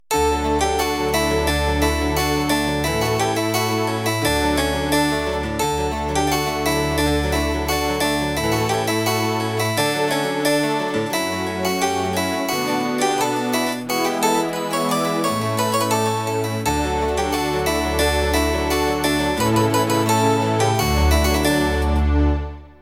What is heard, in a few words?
Deck The Halls